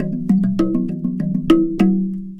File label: Box Drum; Worldly Rhythms; Dance; Sonic Foundry (Sony Creative Software); Ma Ja Le Ethereal Textures